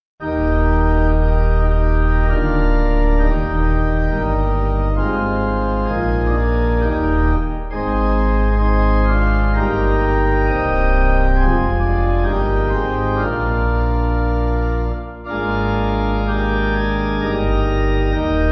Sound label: Bread Of The World Sacrament Pipe, Pipe Organ; 9.8.9.8